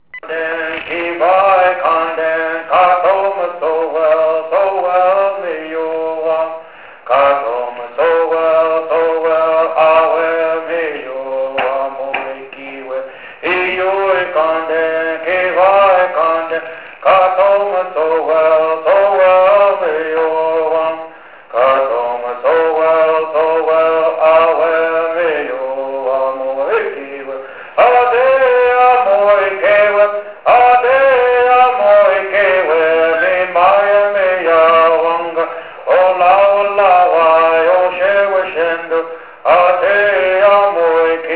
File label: Bird